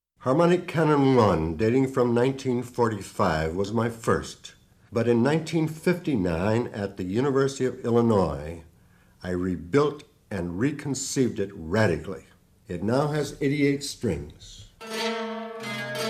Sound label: Harmonic Canon